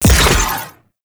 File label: Proj Explosion; Warframe; Sentient; Conduit